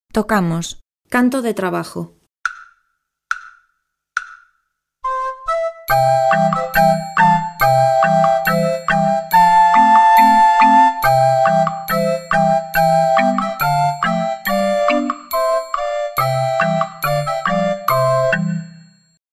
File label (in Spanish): Canto de trabajo flauta